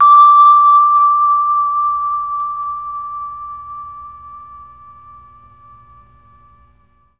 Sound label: D4 VIBES, Mellotron MKII; Mike Pinder - Mellotron Archive CD